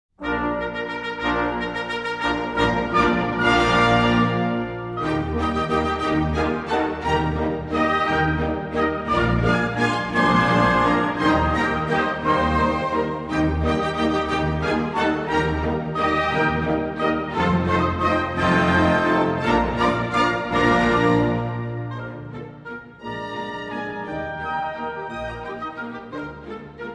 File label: Symphony Orchestra Grand Pas de Deux; Giselle; Act 2